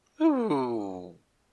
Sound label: logout